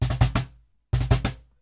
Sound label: Cajon Fill; musicradar